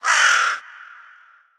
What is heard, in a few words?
crow, outdoor ambient